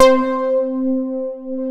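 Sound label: POLY PLUCKS, SYNTHS; Pro-Rec - Synthsonic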